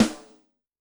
Maple Rim, Yamaha DTXpress-II snare

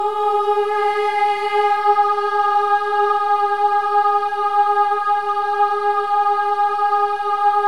OH EH, Symphony of Voices; Spectrasonics

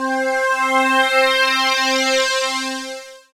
SYN VCF, SYN Analog; Sound & Vision; Gigapack I CD 2

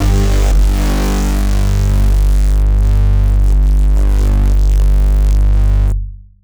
Multiband, Drum & Bass; AMG - Prototype Drum & Bass

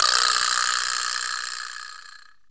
vibra slap; Best Service Advanced Media Trax Modern Composer; LATIN PC

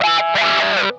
OVER WAH, Funk Guitar; 90 s Sample CDs; Zero G

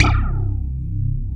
wet bass; Wizoo - Powered DX

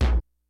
KICKS; Kid Nepro; Elite; KICKS SNARES

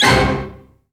HIT

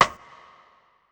Kit, breakcore Drum Hits

